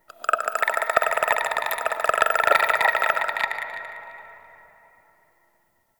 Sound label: Corpus Infested Tendon Chatter; Warframe; Ambience